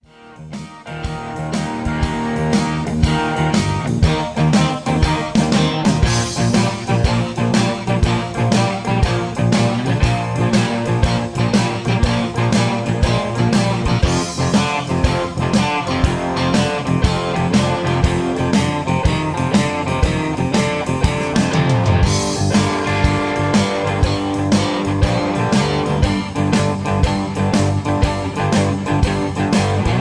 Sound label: I-V-VI-IV Texas Boogie Bb 120; Peach Jam Vol 1 Part 2 Texas Boogie